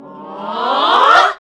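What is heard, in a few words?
classical singing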